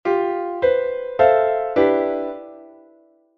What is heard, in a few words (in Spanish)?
acordes